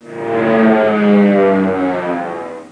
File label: plane shot down; Army Men TiS